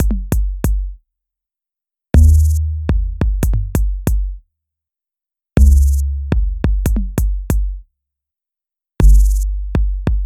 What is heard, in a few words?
Beat